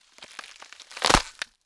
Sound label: BREAK Slow stereo; Phasmophobia